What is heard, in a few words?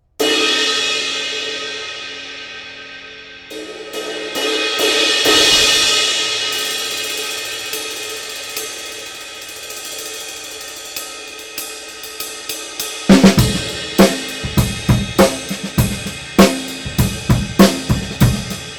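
Paiste Dark Full Ride 21; 2689g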